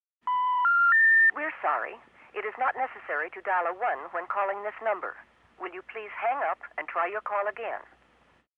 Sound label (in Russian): phone